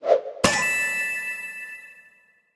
random Wilds Fall Down; finn

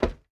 reel Bounce; willyshotchillies

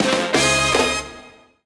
non celebratory win, game; piggy riches

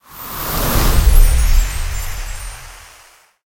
fs Reveal; oceans treasure

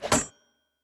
button Click; excalibur

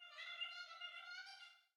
amb People Oct Up; willyshotchillies

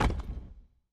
block ground; pyramid

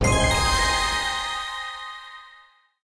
free spin plus two; dracula

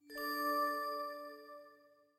bling; imperialriches

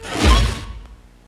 Symbol Transform; knight rider

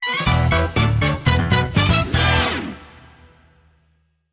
extra large win, slots; king of slots

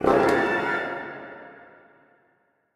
thrill; the wolfs night

